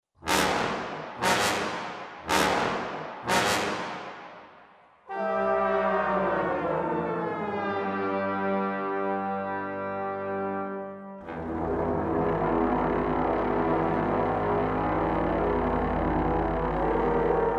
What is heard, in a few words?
Brass Ensemble Trombones Effects